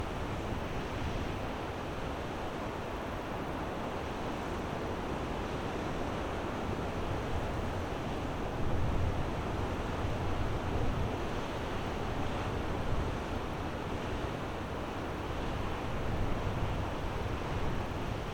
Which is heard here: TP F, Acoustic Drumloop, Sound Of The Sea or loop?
Sound Of The Sea